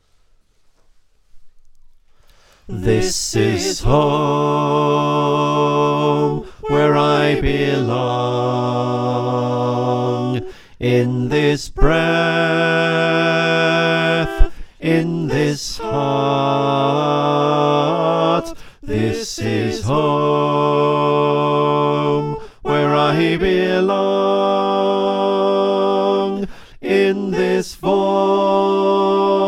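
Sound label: Bass, choir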